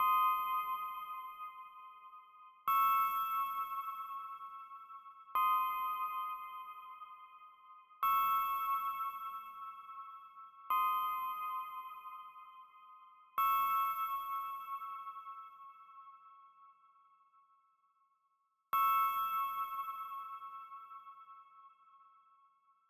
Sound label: 808 Southern Kit